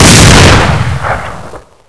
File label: fire cannon